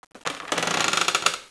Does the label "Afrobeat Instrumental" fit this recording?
No